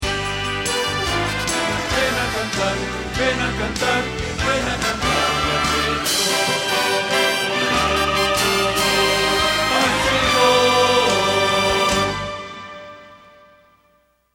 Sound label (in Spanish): Bajo